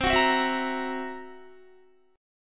C 7th Flat 5th C down Guitar; C7b5 strummed

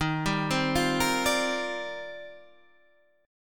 C Minor 11th D sharp

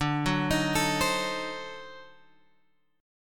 D 7th Flat 9th D down Guitar Open F; D7b9; arpeggiated

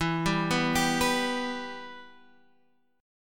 E-Minor, Guitar, Standard; arpeggiated; JGuitar

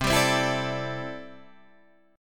C 6th Add 9th C down Guitar Open D

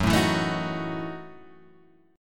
Gb Minor 13th Gb down Guitar Open G

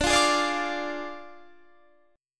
D Diminished D, Guitar, Standard; strummed

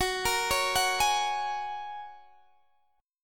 F sharp Major Add 9th, Guitar DADGAD

